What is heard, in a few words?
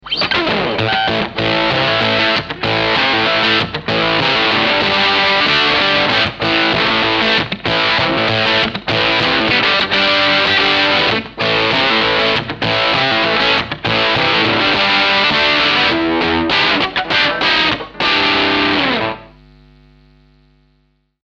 fuzz